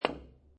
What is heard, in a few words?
Billiard Pool Snooker Hit Billiard Ball With Billiard Cue